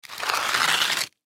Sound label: Ice Block Slide Scrape Cement; Weather; Ice Sound Effects; Part of Ice (Sound Effects:Weather); Blastwave